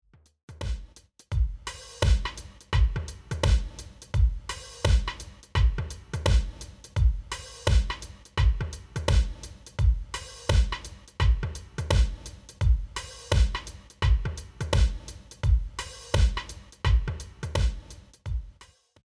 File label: drums gated reverb